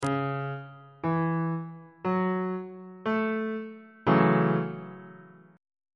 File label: g flat minor 7th 2nd inversion on bass clef